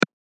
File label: switch on